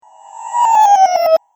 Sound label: Magical Movement; Magic Sound Effects